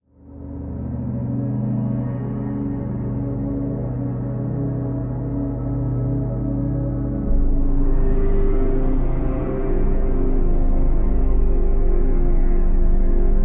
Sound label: Eerie Drone